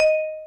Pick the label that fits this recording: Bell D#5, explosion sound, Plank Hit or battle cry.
Bell D#5